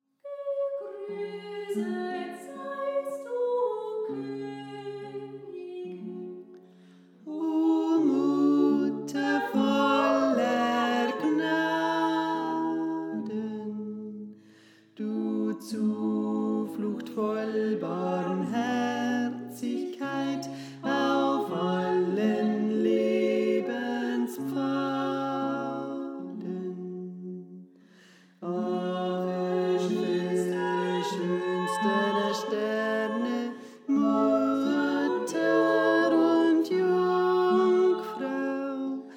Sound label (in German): Tenor; Gegrüßet Seist Du Königin